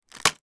toy wrapped in plasic bag packaging put down on table